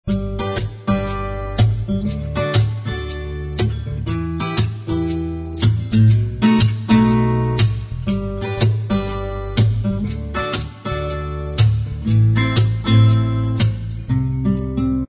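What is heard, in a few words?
acoustic instrumental track, backing tracks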